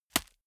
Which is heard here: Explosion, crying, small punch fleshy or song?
small punch fleshy